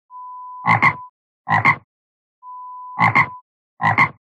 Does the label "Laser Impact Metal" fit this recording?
No